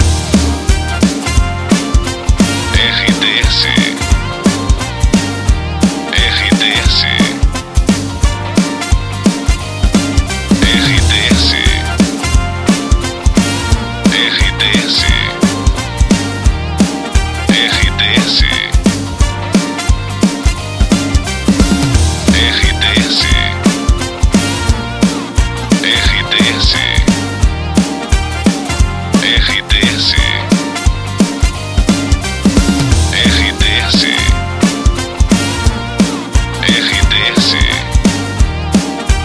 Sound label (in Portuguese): trilha para locução; Pacote Praia FM, Bertioga/São Paulo. Estação com características popular